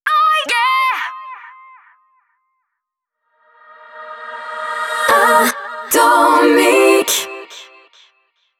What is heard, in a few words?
JINGLE acap; ATOMIC; HABILLAGE ACAP